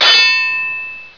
empathy; hipitems

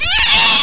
pain; scourge

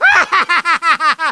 cackle

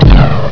chain, weapons

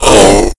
pain; sleeper

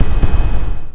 armor

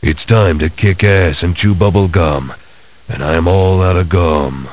chew; duke

